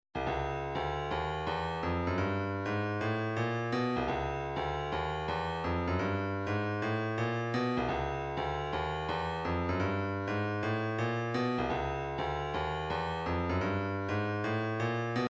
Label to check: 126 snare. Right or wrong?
Right